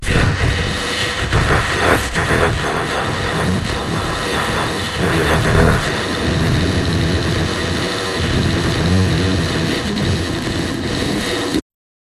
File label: jingle